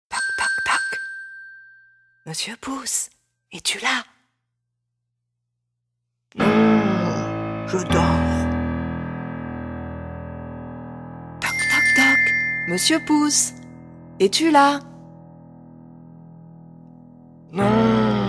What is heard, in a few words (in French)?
Toc Toc Toc; la valisette franco-allemande; chantons avec tom et lilou